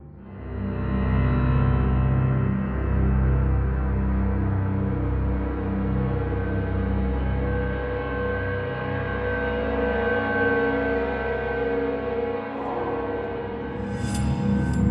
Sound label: Orchestral Ambience LOOP; Unrest Indigo